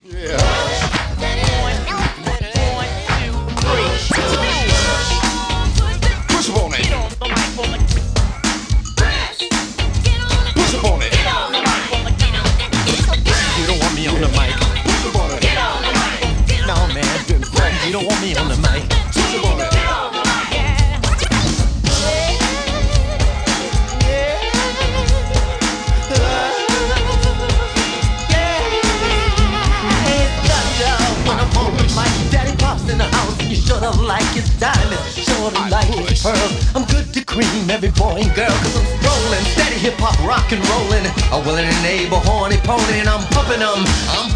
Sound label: vocal